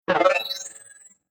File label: Future Telemetry Robot Scifi Shimmer Beep Interface Glitch Space; Sound Design; Robot Sound Effects